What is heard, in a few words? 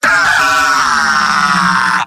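demoman pain critical death; tf2; garrysmod; mvm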